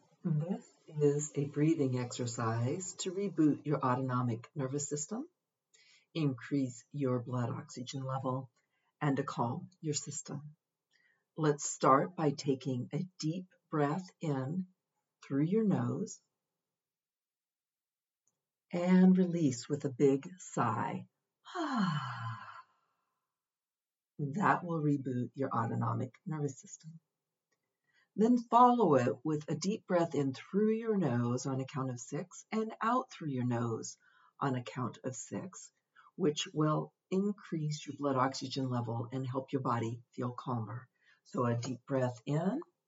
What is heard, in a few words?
Breathing for Calm